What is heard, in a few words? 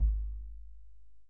Kick, EMU PX-7 Drum Machines